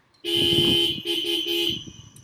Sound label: Blast Triple